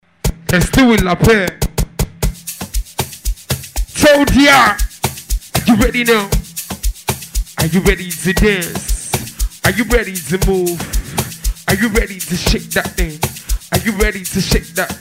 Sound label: party live stream mix with hype man